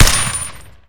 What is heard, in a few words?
RPK